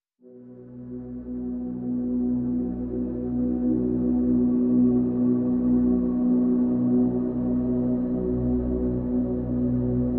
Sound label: Pads (B)